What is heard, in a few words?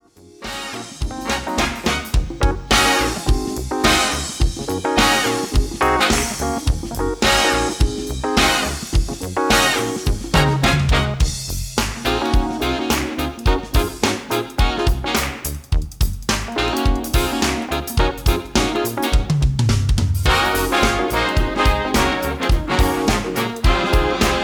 horns